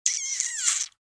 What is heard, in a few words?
rat death; STALKER; customnpcs